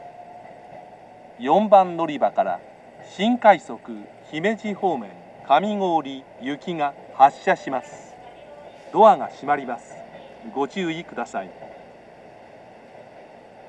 special rapid service; kamigori; jr-west; ashiya

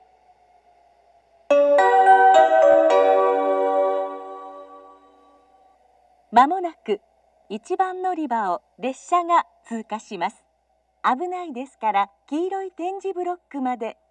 pass, station; jr-west; sumiyoshi

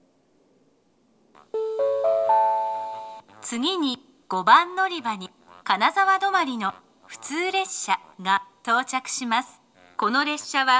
kanazawa turn local; matto; jr-west

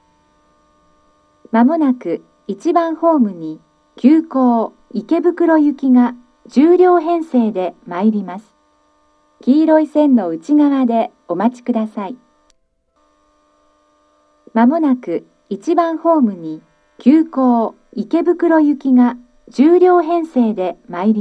motokaji express ikebukuro, seibu station

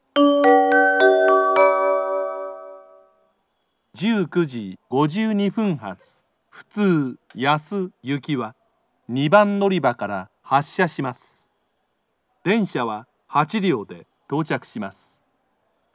local, kyoto, station; yasu; jr-west

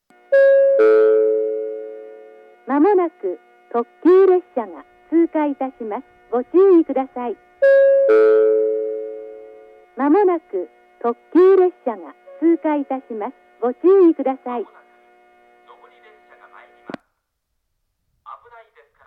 up pass limited express; shinano-sakai; jr-east

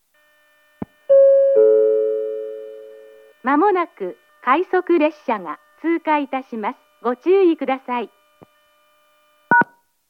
pass rapid; kasashima; jr-east

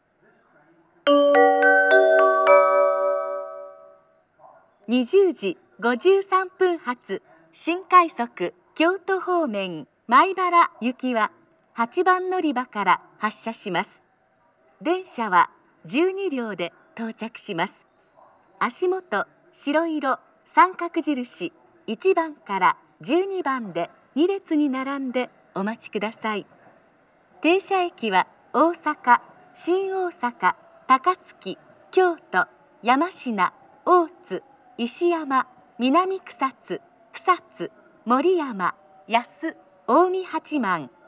special rapid service maibara, amagasaki; station; jr-west

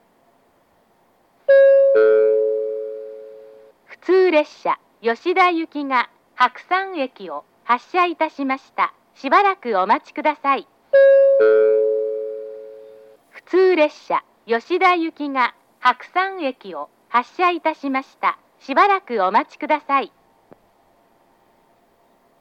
local, station; sekiya; jr-east

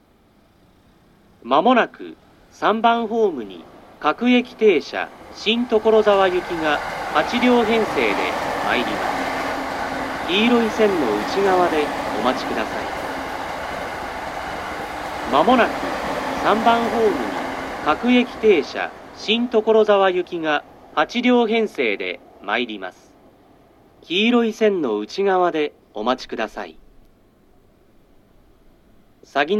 takadanobaba local; shin-tokorozawa; station

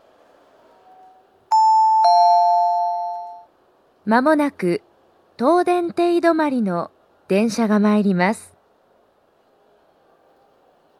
stop; toyama eki; chitetsu

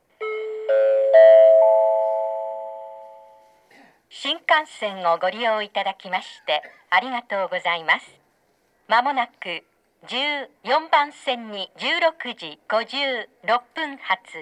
nagoya s kodama tokyo; station; jr-central

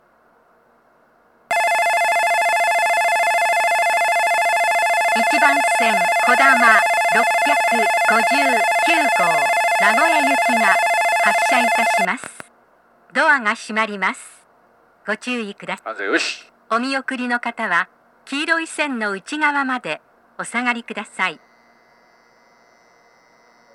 kodama; mikawa-anjo; nagoya; jr-central

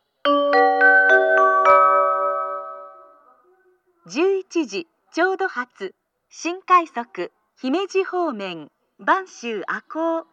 special rapid service, kyoto station; banshu-ako

